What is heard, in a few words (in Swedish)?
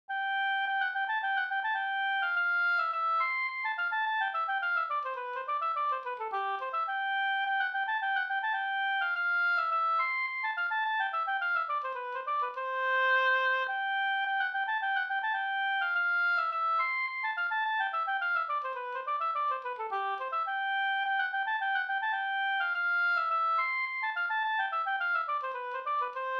polska, Cdur; Byss-Kalle